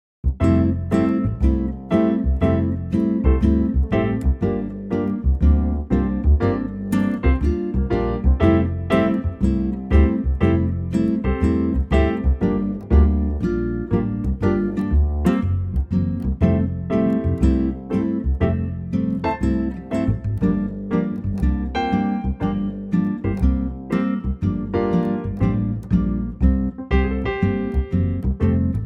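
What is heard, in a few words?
F guitar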